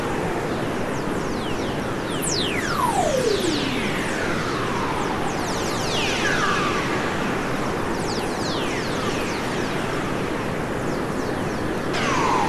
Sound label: WFR audio emfisis